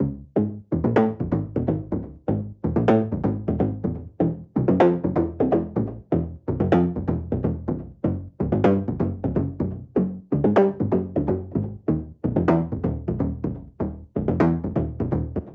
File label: Deep Synth Seq 125bpm